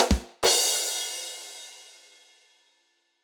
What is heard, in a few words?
Rimshot; butterflysoup2